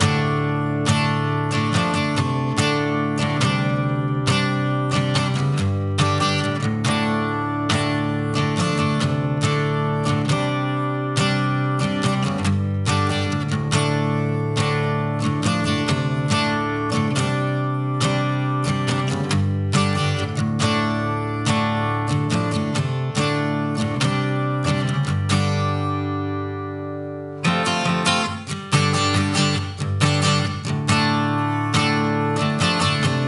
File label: guitar